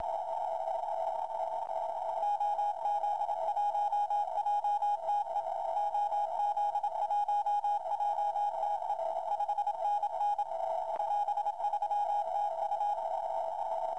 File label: 80M CW; Willis is.; VK9DWX